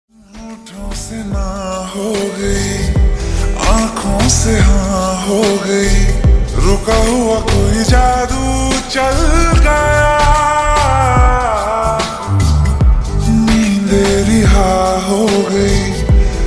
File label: Slowed Reverb Ringtone